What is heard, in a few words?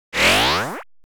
Long Pellets; TransitionsFX; НАБОР DSLR КИНЕМАТОГРАФИСТА